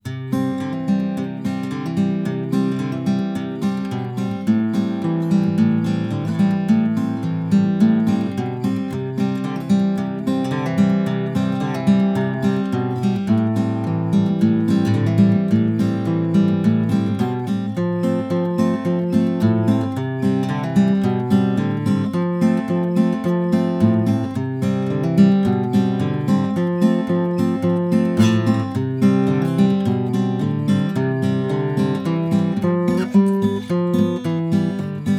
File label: U87i guitar